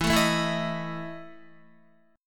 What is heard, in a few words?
E Major 7th Suspended 4th, Guitar; strummed; JGuitar